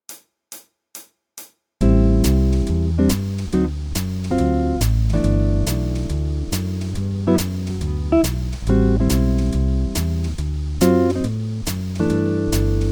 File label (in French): walking bass sur mini grille